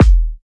natural bass drum single hit e key; kick drum samples

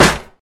pop acoustic snare d sharp key; snare drum samples; one shots

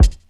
old school rap steel kick drum sound d key

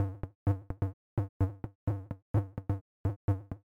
glitchy cinematic analog bass drum play gm; loops

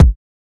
short reverb kick a sharp key; kick drum samples

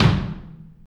long tom drum one shot c sharp key

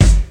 dry bass drum single shot g key; Duration 0:01; Samplerate 44100 Hz

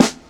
natural snare sound A key

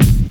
90s hip hop kick sound a key; kick drum samples

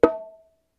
bongo high pitch hit; percussion; single hits one shots

